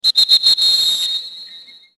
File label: whistle; csgo